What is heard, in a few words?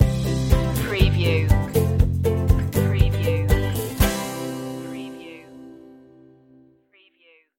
born to be happy stinger